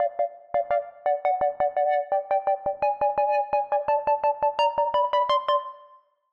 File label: Keys Line 85, 85bpm, hiphop; musicradar